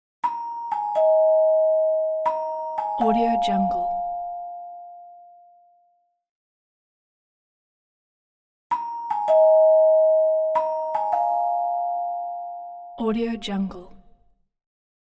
doorbell ding dongs; prosoundfx

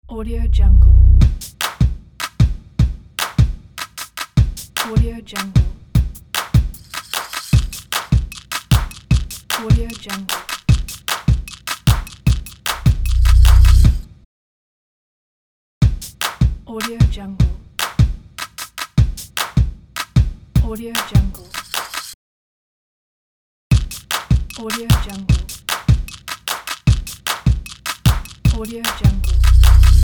percussive opener

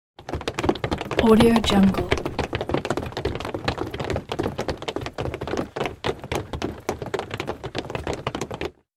crowd running in place

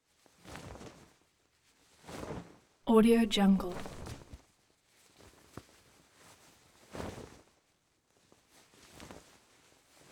cloth frump simple

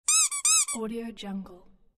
rubber duck

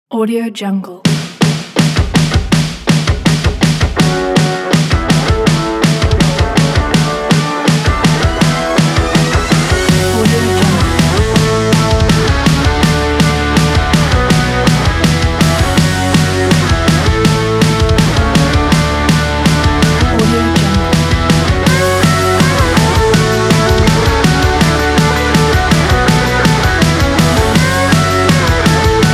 rock and roll

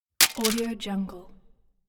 cd case drop

